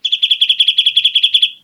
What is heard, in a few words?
Bridled Titmouse